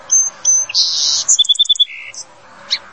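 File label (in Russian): писклявое щебетание воробьев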